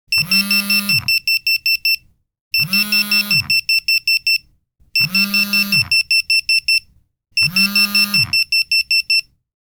Default clock, Alarm Clock; Nokia Design Team; Alarm Clock Retrospective

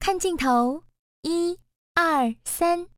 cam Ready; KV510; LG

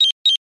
Chirp, notifications; Samsung; Galaxy Watch 8

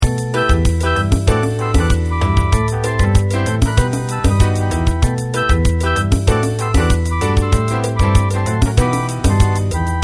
samba; Motorola; Tribal Brands

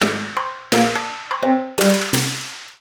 Percussion Note; Meitu; M2

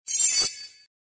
Beep

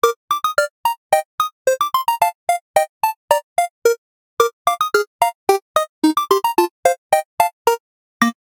Arcade Game; Retro

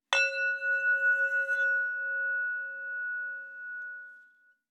Singing Ball, notifications; Vestel Venus

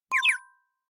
Tweet, notifications; Samsung; Galaxy A56

